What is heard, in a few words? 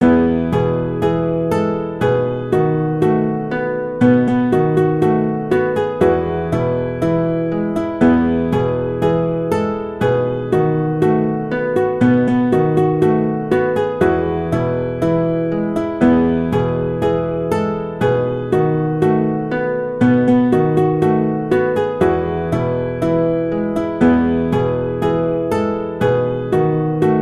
Hush Little Baby Guitar